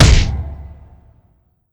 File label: metal hit, impact metal